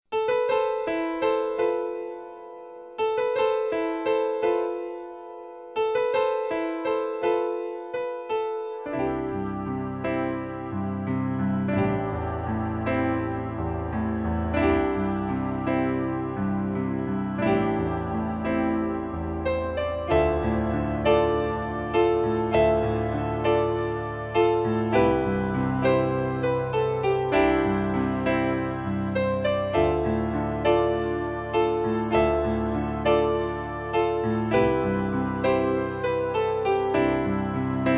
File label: Music- Sorrow Theme